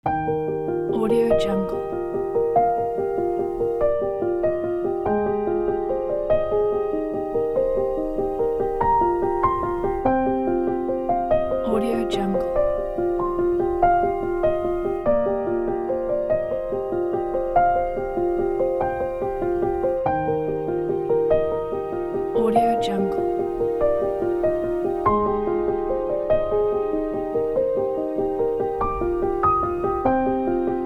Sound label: positive calm